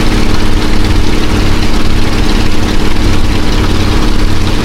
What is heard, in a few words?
griffon cockpit, engines; War Thunder